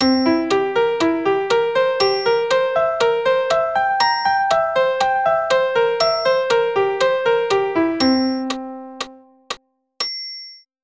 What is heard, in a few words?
C7 broken chord